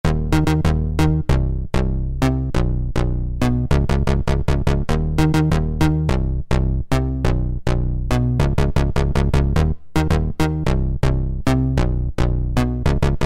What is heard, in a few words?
PROPHET puls bass; Kurzweil K2000 Best of Analog